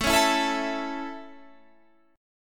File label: A Major, Guitar, Standard; strummed